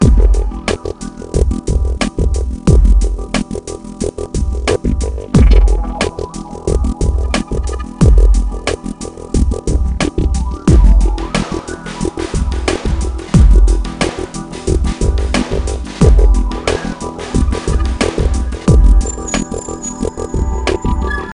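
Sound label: ambient background loop; CSI: Crime Scene Investigation Deadly Intent The Hidden Cases; NDS gamerip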